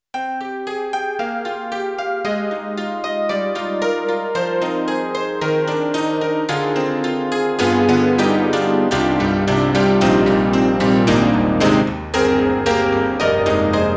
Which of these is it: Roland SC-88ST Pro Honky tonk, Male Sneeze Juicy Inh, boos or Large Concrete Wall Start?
Roland SC-88ST Pro Honky tonk